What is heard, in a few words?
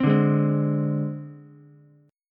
Ab Major Flat 5th Ab Ukulele; AbMb5 strummed; JGuitar